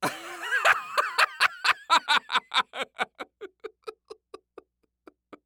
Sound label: Human Male Laughing